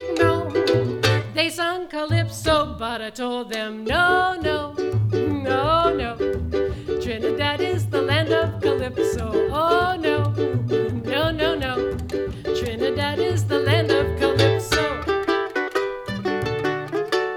Land of Calypso, musicbox

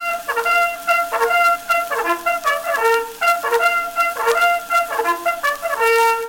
Army bugle calls

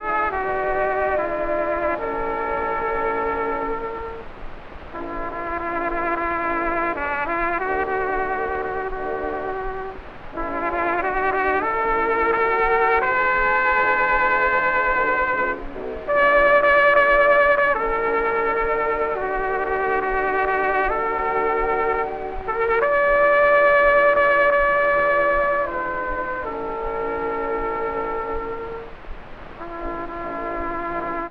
trumpet solo with orchestra, saxophone

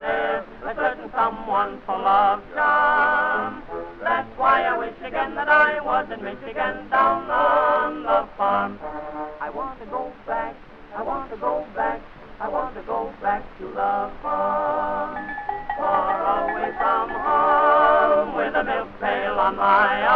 tenor and chorus orchestra accompaniment; NY Hippodrome

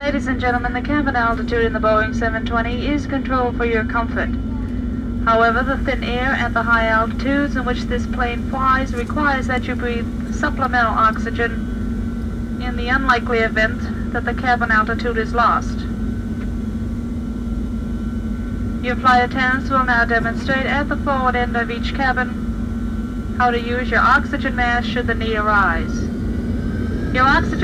Airplane Jet trip

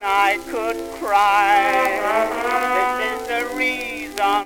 Bell hop blues, jukebox

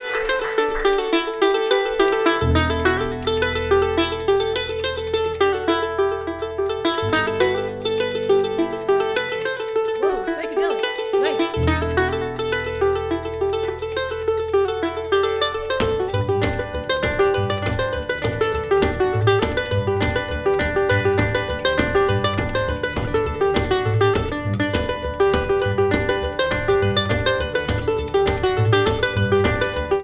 Brazilian Tune, musicbox

